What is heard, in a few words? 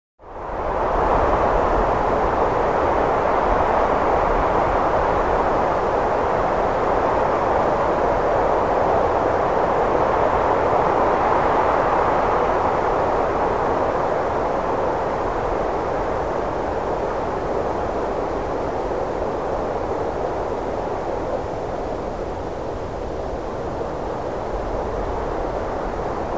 Light Wind Airy Gusts